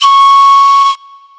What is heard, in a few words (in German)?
Pfeife mittel